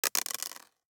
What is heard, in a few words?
DICE on Plastic, Throw and Roll, Mini, 3 Three Dice; Samples; ArticulatedSounds; DICE Sfx Party (Extended)